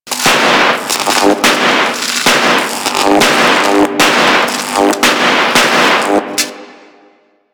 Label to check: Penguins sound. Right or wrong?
Wrong